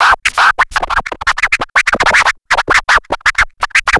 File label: scratches